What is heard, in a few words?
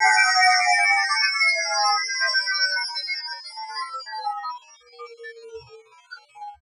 LIQ SW, STRANGEFX; Total Control - Action Cutz